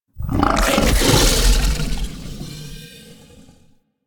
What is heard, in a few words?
Infested Devourer Teleport End; Warframe